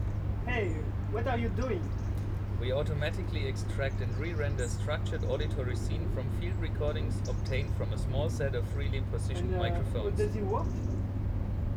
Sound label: mono Outside